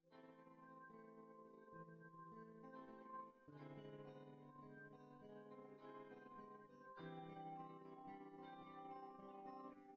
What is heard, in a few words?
no drums synth conv 5cm sim; SASSEC07